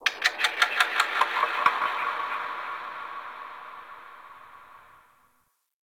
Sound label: Venus Landscape Distant Creatures Zone Two, Ambience; Warframe; Venus Open World